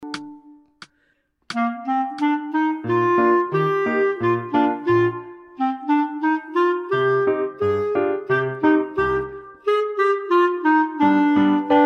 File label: Clar